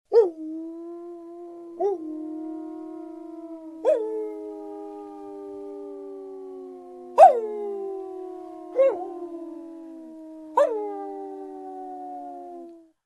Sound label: howling wolves many; zvuki volkov